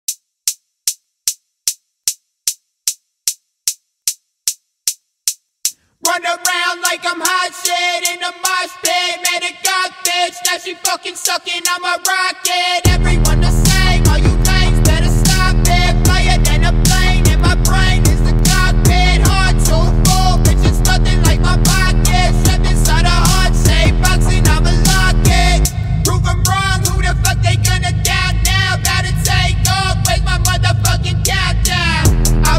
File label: Rocket bass boosted